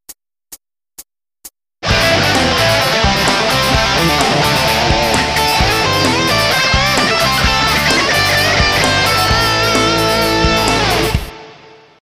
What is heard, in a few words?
lick; workshop